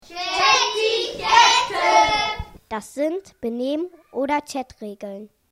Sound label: chorus